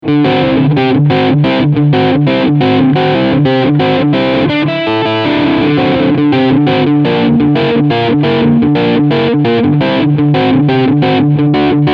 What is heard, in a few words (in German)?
Crunchsound beide PUs